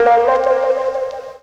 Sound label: Wah